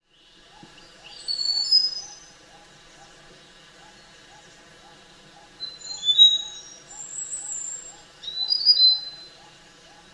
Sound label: Mountain Tailorbird Phyllergates cucullatus cinereicollis, mountain tailor bird call